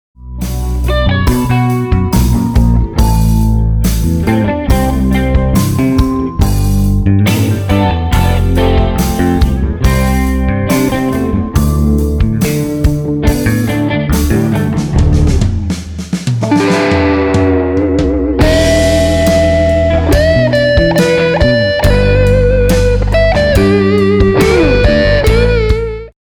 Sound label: no Vocals